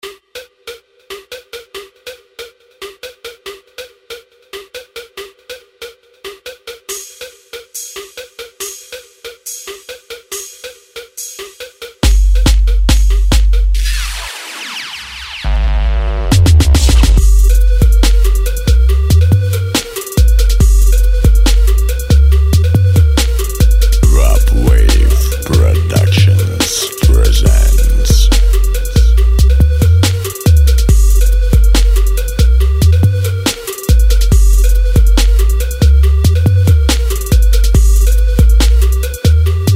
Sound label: beat